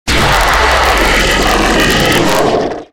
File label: jumpscare; jibboo